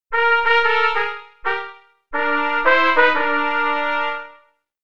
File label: Jazz Duet qn=120